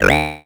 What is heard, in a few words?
Frog Bass, 8-Bit; Games And 8-Bit Kit Volume2; atomsplitteraudio; chiptune